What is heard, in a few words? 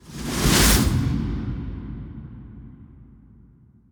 Cut Scene Stingers